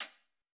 japanese pop hihat

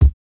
Jazz hop kick

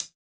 Hihat; urban grooves; Rock

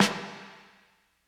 80s reverb snare; Acoustic

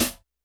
Classic hiphop hihat

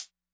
disco hihat; Electro

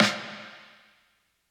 80s reverb snare

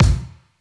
drumbreak kick dry; Hiphop

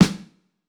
japanese pop snare

